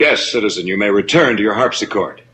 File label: harpsichord; TV; batman citizen